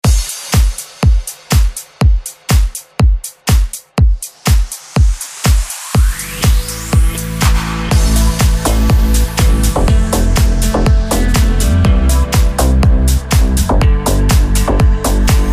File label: future house redrum 122 bpm